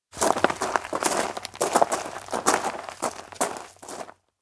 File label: steps outdoor riverside arrive; kigurumi; awayuki; anemoi